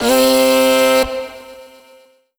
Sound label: Omnivox oneshot C, Vocal; One Shots; Slate Digital Omnivox Vocal Sample Pack